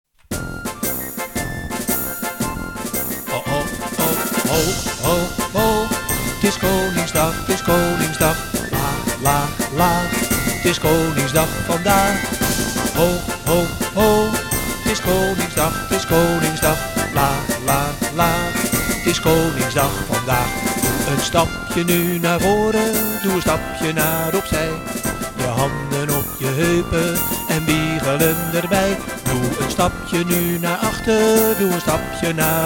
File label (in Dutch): zang